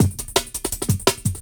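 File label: breaks gated, JUNGLE; M8JUNGLEPAK1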